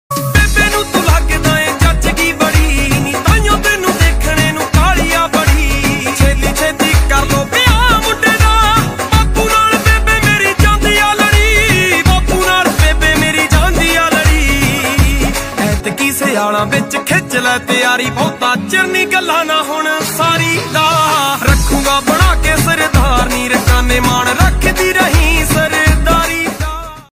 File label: ringtone punjabi song